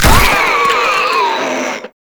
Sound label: Inked Humanoid Death; Enemies; Warframe; Shadowgrapher